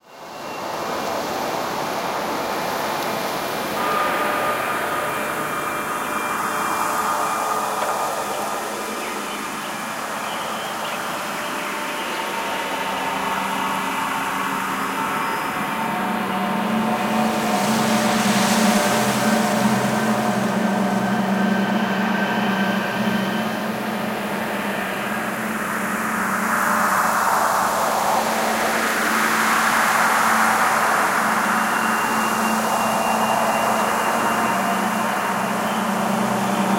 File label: Ambience Peaceful; WoW; Shadowlands; Bastion